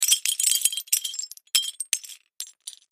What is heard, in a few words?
Wood Tile Sml Squares, debris